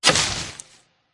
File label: Gain Farm